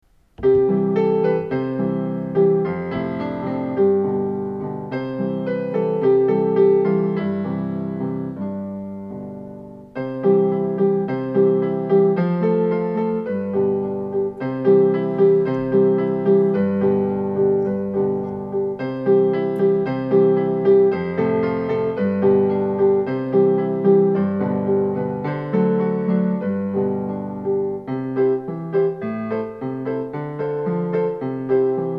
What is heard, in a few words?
piano; Le corbeau et le renard